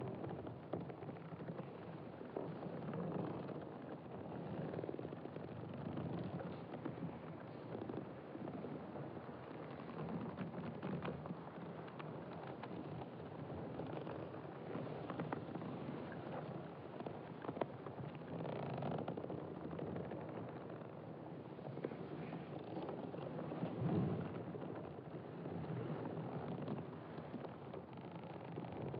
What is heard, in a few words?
glider fly